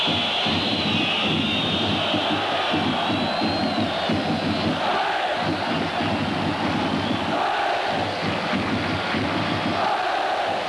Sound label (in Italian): tamburi; romadundee; Rullìo di tamburi # 1